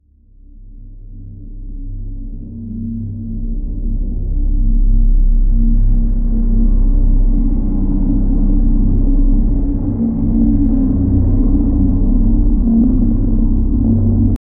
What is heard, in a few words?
dark slow horror ambient; atmosphere